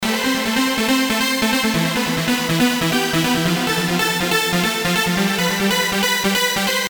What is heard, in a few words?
trance synth; A lead trance synth.